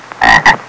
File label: Single frog croak